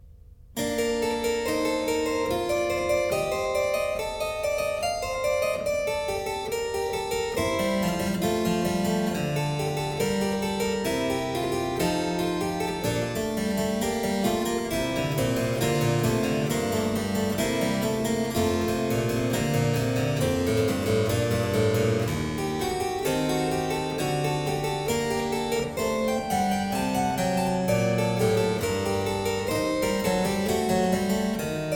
Prelude, Keyboard Works, The Well-Tempered Clavier; BWV 868 B (H) I; Klassika; Bach, Johann Sebastian